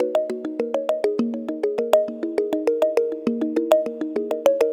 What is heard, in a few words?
Tongue Mallet Echo; Melodic; Ambient-Melodics-II